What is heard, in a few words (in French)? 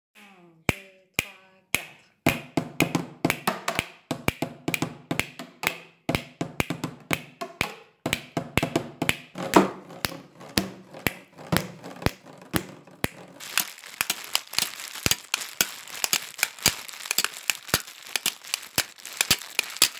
Musique en plastique